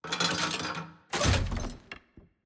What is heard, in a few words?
Cable Pick Up